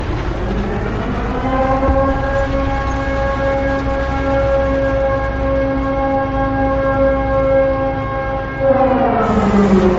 nuke siren; war thunder

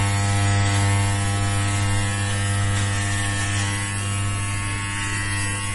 hair clipper

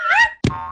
whirly slip and fall

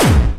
laser gun

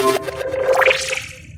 invitation; warframe

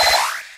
sentry beep

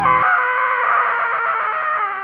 scream of doom

